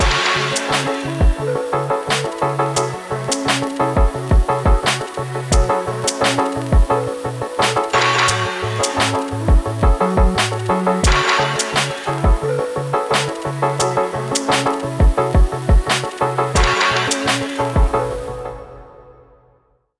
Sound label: Tabla and Flute